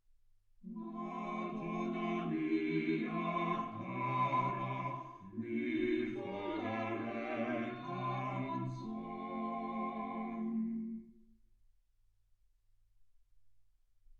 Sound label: quartet